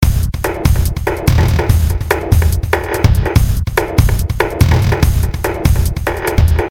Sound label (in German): Ambient Loop 120 Prozent